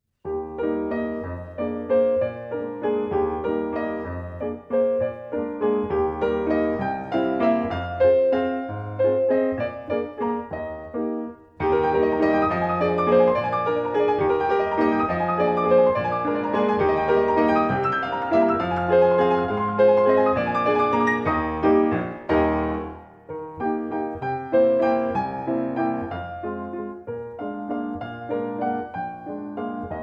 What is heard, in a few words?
Walzer Es Dur